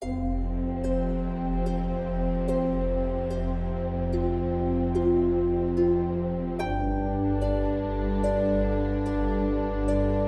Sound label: Elven Harmonies no percussion; Heavenworld